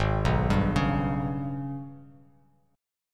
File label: Gsharp Minor Major 7th Double Flat 5th, Bass Standard; arpeggiated; 4,2,5,6